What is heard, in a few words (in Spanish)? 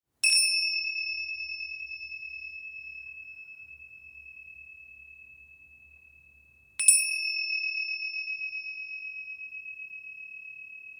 Tingsha grande, tingshas; Tingsha grande 8.4